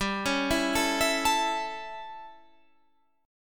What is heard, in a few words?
A 7th G, chord